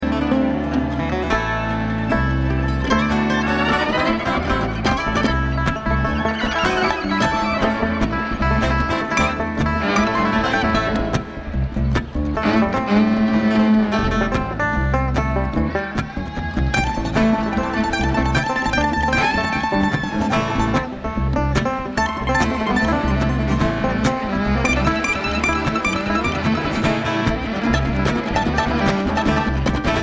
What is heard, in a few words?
Traffic Jam